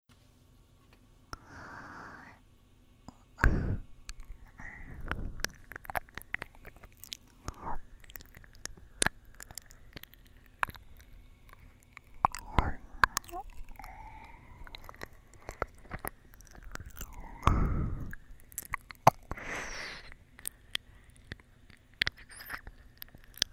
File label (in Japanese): 優しく癒される耳舐め